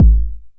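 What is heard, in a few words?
909 BD tape, kick drum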